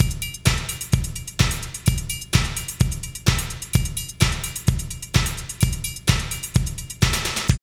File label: LOOP, Tekno Industrial; East West